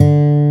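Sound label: D 2 OVATION, acoustic guitar; AKAI S6000 CD-ROM - Volume 1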